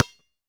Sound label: Street Ball Bounce Concrete